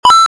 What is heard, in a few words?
eat money; scratch; music; 馬利歐素材; 軟硬整合